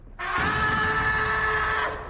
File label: scream; guzzlord